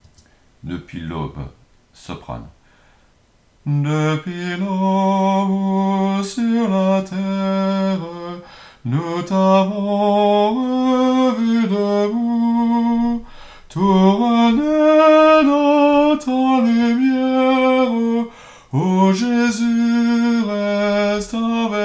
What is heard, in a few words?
soprano; Depuis aube